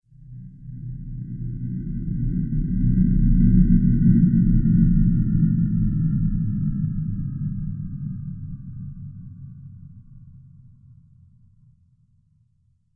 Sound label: Deep Space Motion, Science Fiction Sound Effects